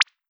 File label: cute pop